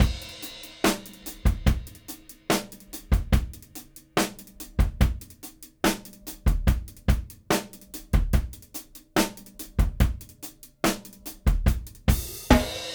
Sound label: Rock 72 bpm, drums; Loops; Ableton Live 12 Lite; Core Library